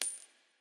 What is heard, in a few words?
count Up; the wolfs night